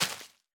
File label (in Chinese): 大型垂滴叶破坏声